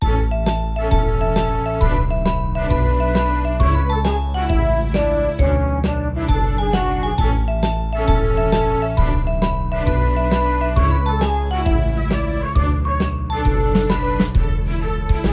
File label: Like a christmas